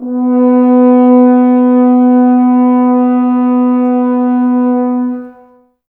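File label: Brass French Horns, Woodwind Brass Ensemble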